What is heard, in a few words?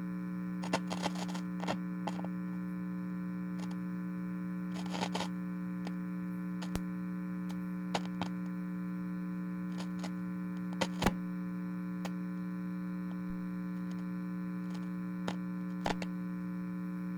speaker loop; Apotheorasis